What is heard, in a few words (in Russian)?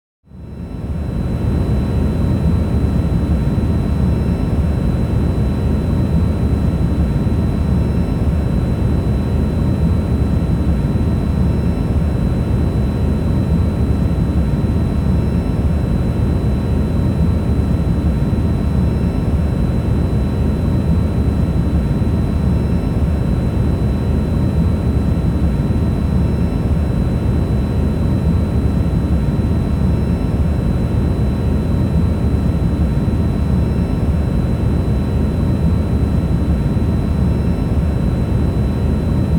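На борту самолёта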